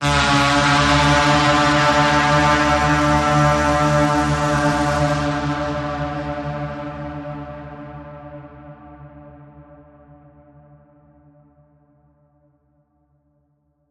Battle Horn

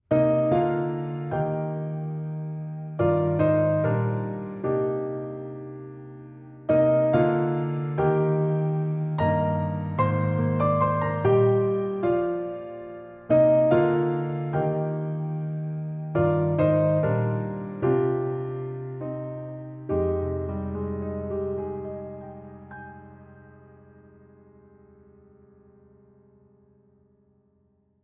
Solo Piano

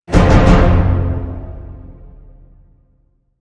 Hit Orch Tutti Triple F

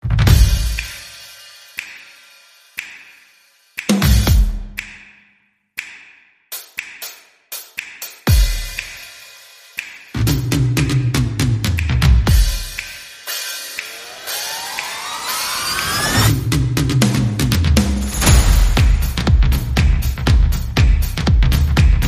Cool Cat Swing, Percussion and Effects

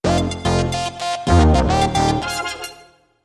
Sting No Drums

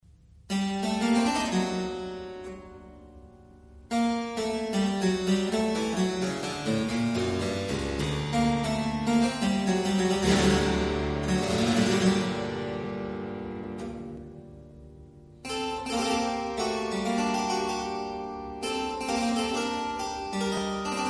Praludium in A minor; BWV895; Johann Sebastian Bach

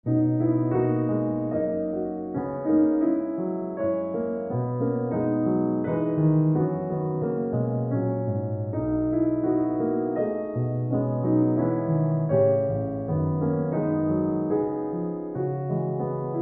Solo Piano

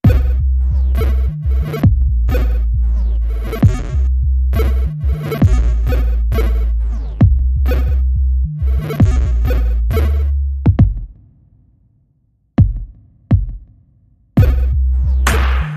Electronic

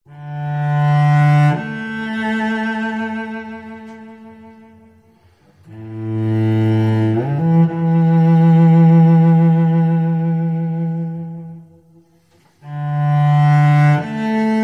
Melancholy Cello

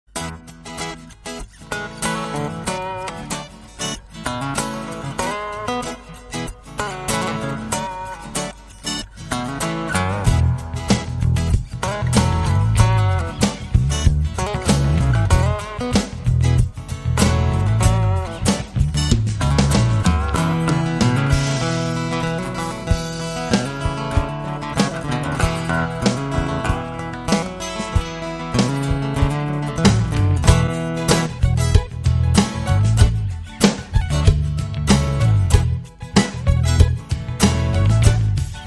Gaelic Groove

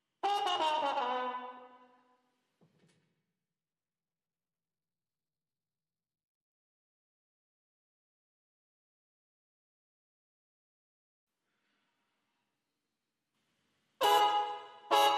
Trumpets Clean

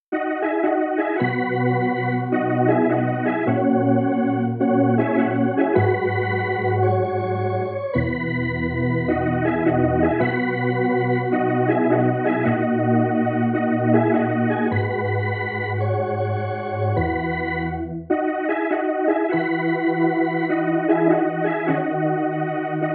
Annoying Lyre (Organ)

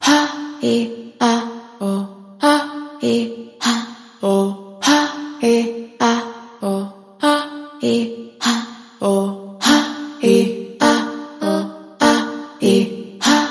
Vocals Female Chant

